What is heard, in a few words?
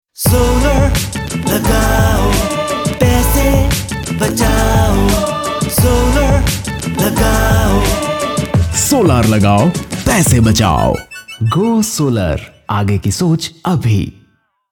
Solar Jingle